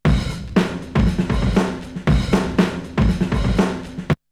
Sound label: Drumloop; ZERO G - Datafile DISC 2